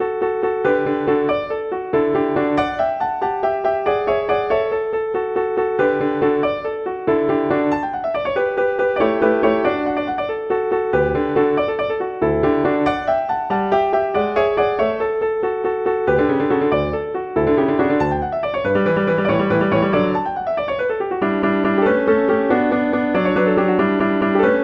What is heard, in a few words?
Sonatina, Allegro spiritoso; Clementi; Sonatinas